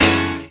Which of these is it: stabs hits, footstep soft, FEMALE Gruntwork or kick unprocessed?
stabs hits